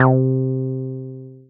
Synths BASS

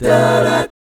B13B5, GUY CHORDS, Vocal, Jazz; ILIO; Vocal Planet VOL-3; Jazz & FX

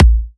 Electro Kicks; Vengeance - Essential Clubsounds Vol.5; Bassdrums

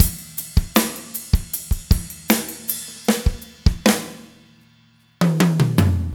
rock drums stop, 78bpm; Quazibeat; Rock Heaven Drums

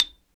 XYLO, Afro Xylophn

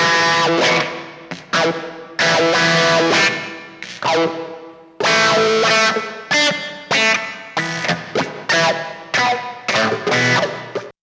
GTR; East West - Phat & Phunky CD2; 90's Sample CDs

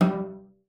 CELLO FINGER G3; Xsample VOL-5

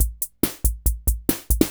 140 POPS

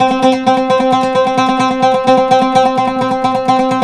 PLK OUD, Roland LA Composer

